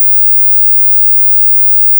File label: Square 20000